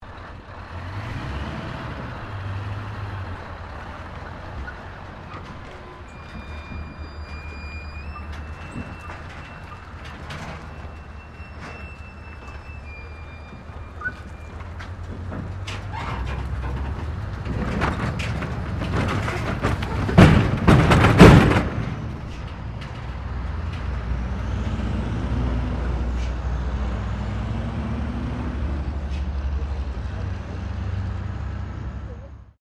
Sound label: Traffic Semi Trailer Turning Over Bump; General traffic sounds; Traffic and Cars